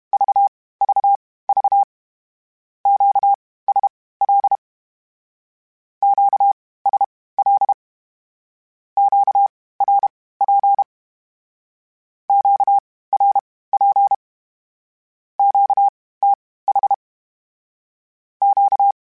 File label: Q Calls Twice spaced, Morse Code; CW Code Groups; HamRadio